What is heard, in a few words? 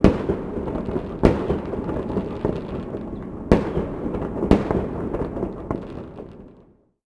salute; World of Tanks Blitz; hangar 9 may